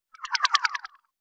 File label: Heli wash down